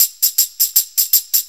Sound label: Shuffle Perc, Perc Loops